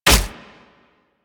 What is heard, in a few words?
Nunchuck Catch, Weapons Infested; Warframe